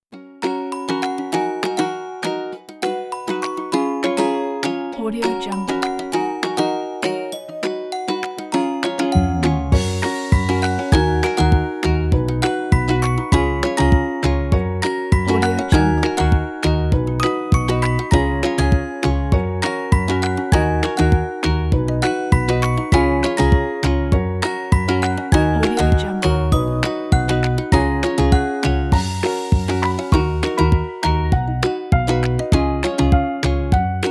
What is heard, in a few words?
children music; pinrecords